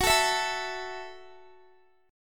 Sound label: F sharp Minor 7th F sharp, Guitar; F#m7 strummed; x,21,19,21,21,x